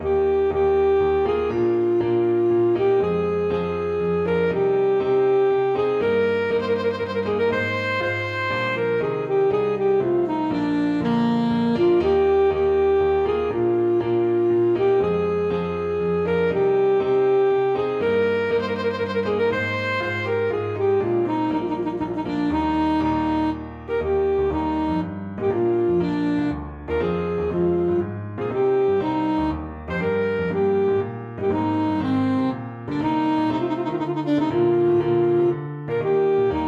chaconne, saxophone; fairy queen

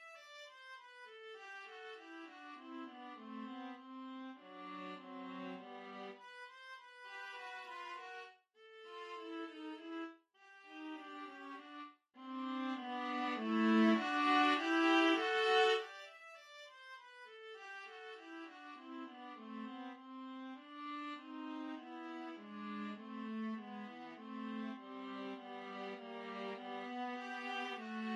VLA duet, viola duet; la virtuosa

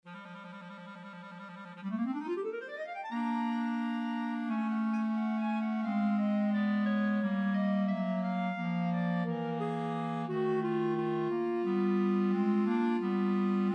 rhapsody clarinet, clarinet trio

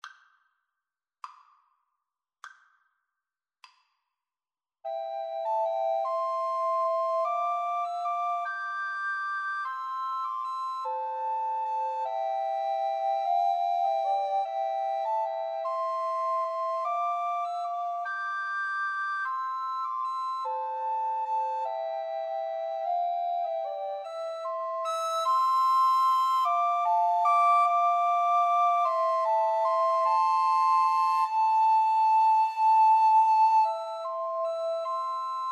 skye boat, Recorder Trio

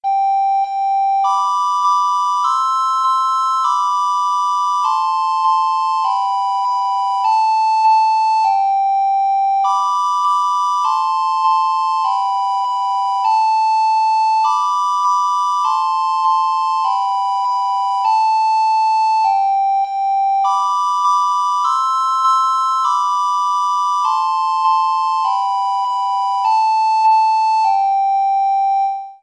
recorder duet; twinkle

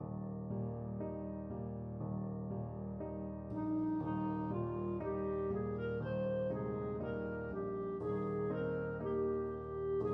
irish lullaby, clarinet

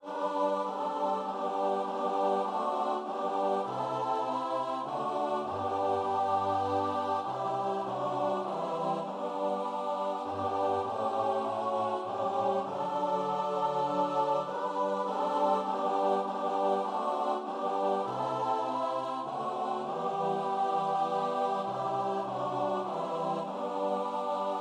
faith of our fathers choir

